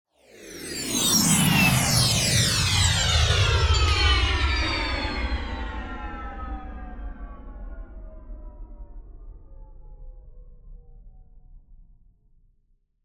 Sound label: Space Ship Passing Fly By - High Speed Sci-Fi - In and Stop; Hollywood Edge; sound designer's toolkit v2